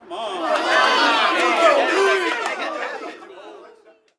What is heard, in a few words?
Street Ball Crowd Encourage; Crowds